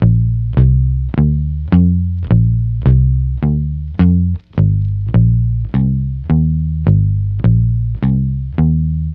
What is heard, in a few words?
Multi Guitar Swing Bass-A#, 105; Zero-G Kocktail Kollection; Construction Kitz (Lounge)